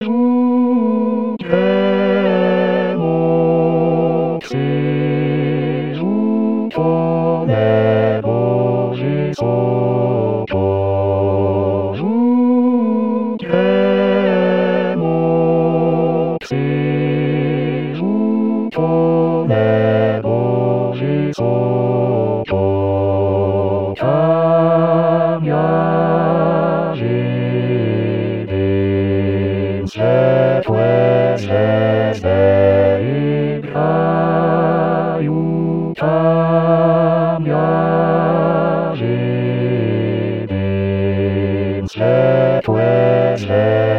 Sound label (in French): basse